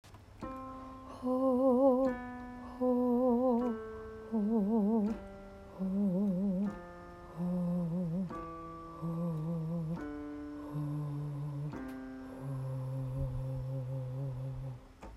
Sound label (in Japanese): uragoe